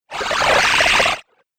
Wacky Water Video Game; Video Game Sound Effects

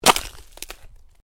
Ice: short crack of ice under pressure; Snow Sound Effects; Snow (Sound Effects:Weather); Stuart Duffield

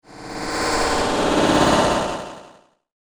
Horrific Whooshy Sound

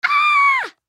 Human Scream; Scream Male Six Yrs Boy; Blastwave

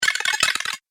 Robotic Intestines, sci-fi robot sound effect; Andrew Fleming; Twistedtracks

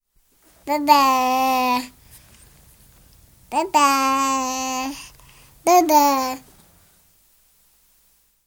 12 month old baby boy, saying Dada (three times); Baby Sound Effects; BBC

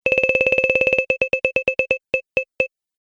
Game Points Fast To Slow; video game

